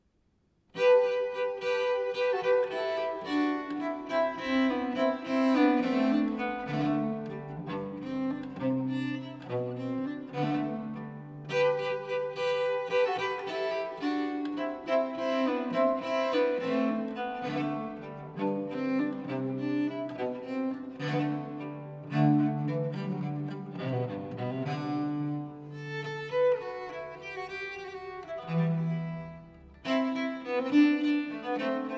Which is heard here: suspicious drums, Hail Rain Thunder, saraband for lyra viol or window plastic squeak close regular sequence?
saraband for lyra viol